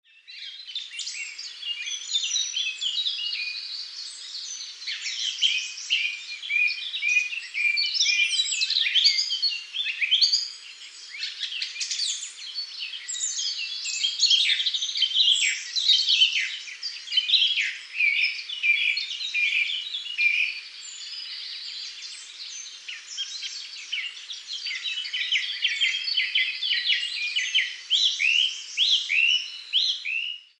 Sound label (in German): natur